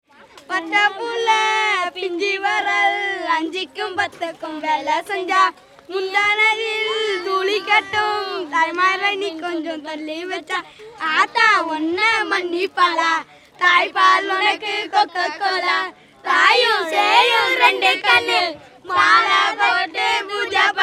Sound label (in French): chant